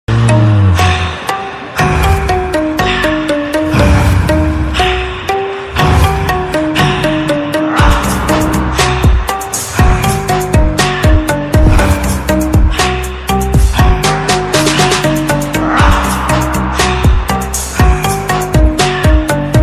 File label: title bgm ringtone; zombie reddy